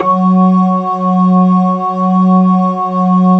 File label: organ F#2; Best Service Alpha Dance vol.2